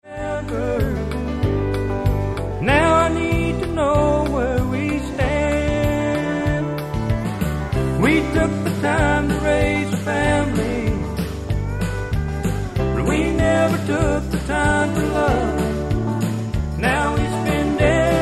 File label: Country Two Step Up Tempo